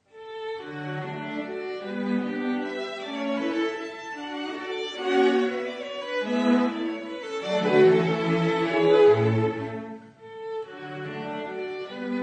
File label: Viola Quintet in D, Menuetto Allegretto